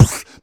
Beatbox Snare, Human Beatbox

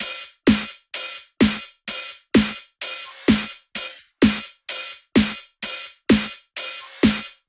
128BPM Flatbeat Kit

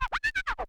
Transform Med, vinyl record cuts longs

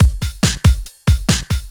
Loops BB 140, BreakBeats; Vengeance Samples

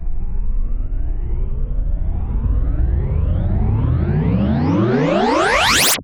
Uplifter

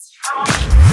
Fill Ins 128BPM; Vengeance Samples

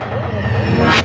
Short Fills & Reverse; Vengeance Samples

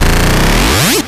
Acid Sounds H, Synths; Vengeance Samples

